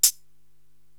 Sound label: HH Tekno Noize Close, Korg TR-Rack drum machine